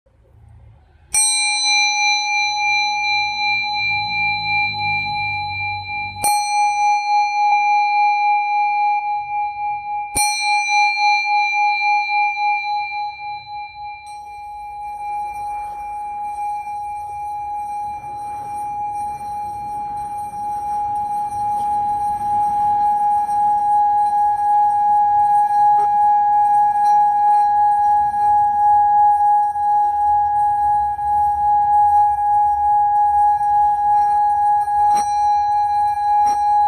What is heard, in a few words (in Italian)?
Suoneria Campana